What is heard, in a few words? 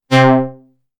Multiwave Lead